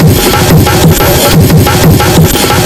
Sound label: break 180